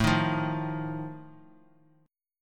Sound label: Eb Suspended 2nd Flat 5th A